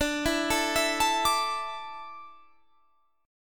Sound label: D Suspended 2nd, Guitar, Standard; 22,19,19,21,22,22; arpeggiated